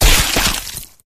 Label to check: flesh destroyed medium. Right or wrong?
Right